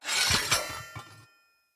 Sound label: Knight Axe Equip; Weapons; Enemy; Axes; Snc Knight Axe